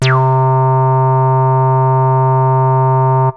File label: BASS EMU acid bassliner; Bliss; Polyend; Legowelt